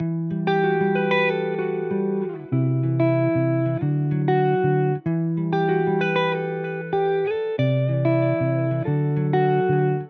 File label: 95BPM Key em, real guitar loops; StreetJustice; vibe session